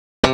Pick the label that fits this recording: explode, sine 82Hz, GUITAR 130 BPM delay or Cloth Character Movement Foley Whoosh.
GUITAR 130 BPM delay